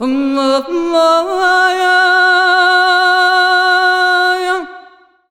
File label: THA VOICE R; USB Soundscan vol.63; AKAI